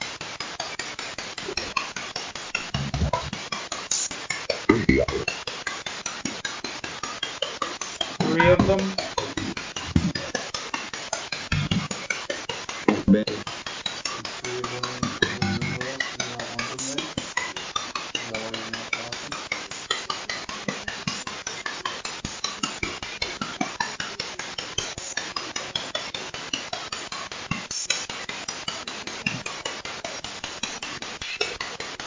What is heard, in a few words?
Train Station; Mars Train Station – Return Investigation 2024